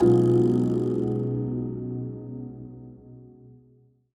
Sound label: You Lose; ArmyRaid; Interface and Item Sounds